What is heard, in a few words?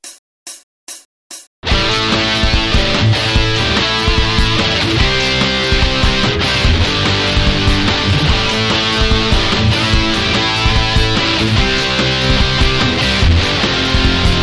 tap jamtrack